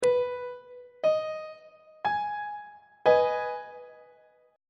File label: G sharp minor 1st inversion on treble clef